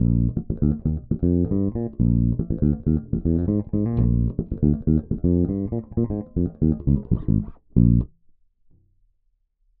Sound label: Ibanez EHB1006MS Mid Boost High Cut active; Jaco